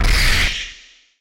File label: kick high, battle